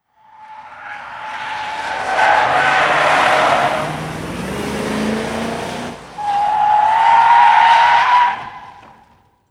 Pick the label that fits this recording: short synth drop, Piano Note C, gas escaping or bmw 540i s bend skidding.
bmw 540i s bend skidding